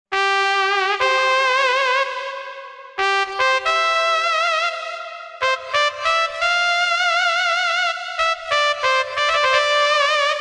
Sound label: trumpet